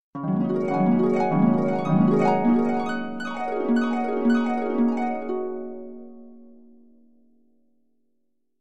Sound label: Harp Sequence Dream